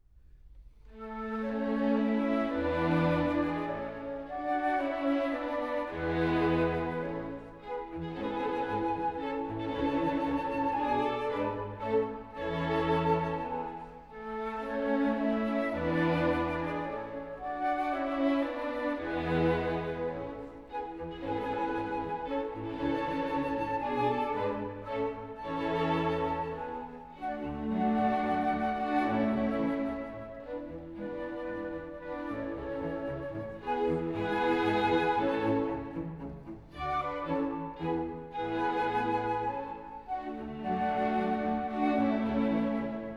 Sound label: Minuet for Orchestra in A; Wolfgang Amadeus Mozart; Symphonies